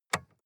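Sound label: sports car interior lightswitch; verkehr transport; autos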